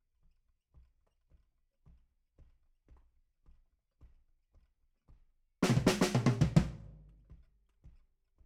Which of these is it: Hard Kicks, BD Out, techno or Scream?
BD Out